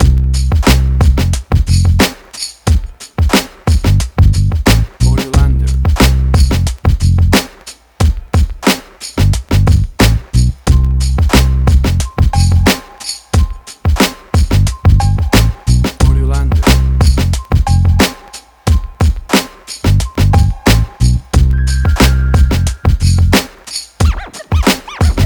90s Hip Hop; Jefferson Boulevard

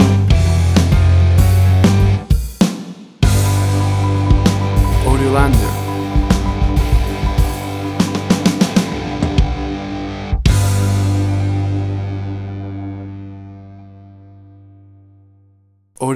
Boogie Woogie Bar Blues Stinger

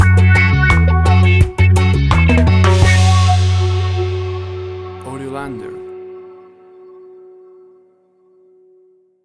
Cool Guitar Reggae Rhythms Stinger; AUDIOLANDER

